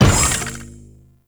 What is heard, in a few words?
Lift Move Start; Warframe; Duviri